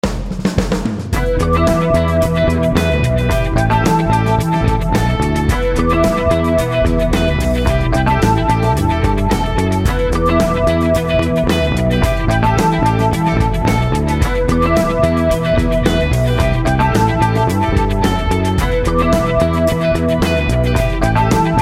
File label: guitar echoes